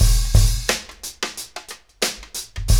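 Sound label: 88 bpm, Funky Drums; AMG; Now CD-ROM (Roland); Neil Conti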